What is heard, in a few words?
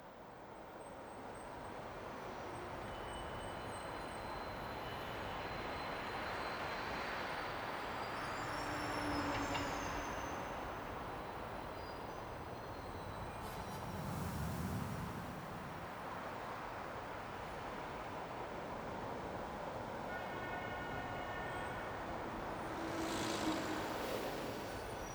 city loop winter